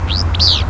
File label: fizz bew, Empidonax traillii; WIFL; Deer Lodge, Powell County, Montana; Arch McCallum; Passeriformes; Tyrannidae